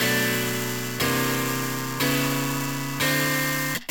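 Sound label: wet guitar chords, Multiband neural audio effect; Demo without amplifier; Wet-NoAmp; prism distortion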